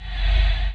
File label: wahh